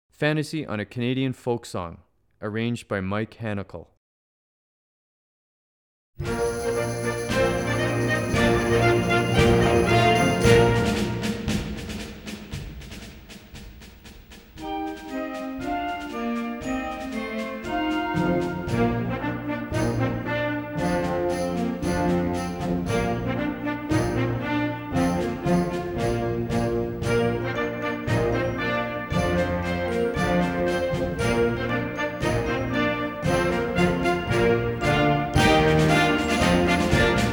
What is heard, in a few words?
Fantasy On A Canadian Folk Song